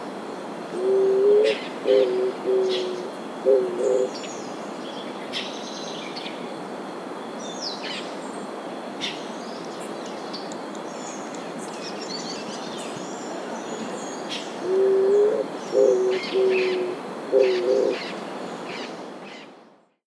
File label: Eared Dove Zenaida auriculata